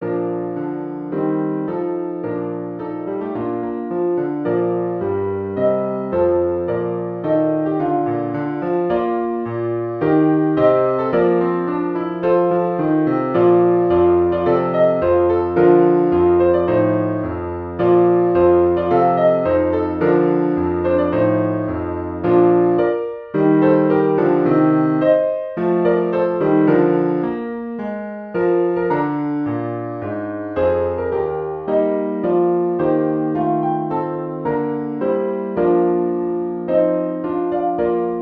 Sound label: offeratory for piano